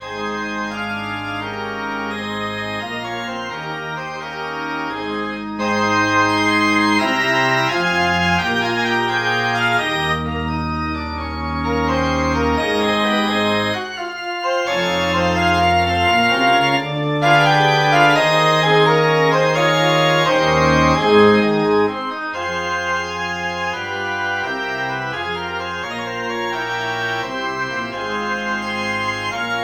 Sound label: Allegretto semplice for organ; synth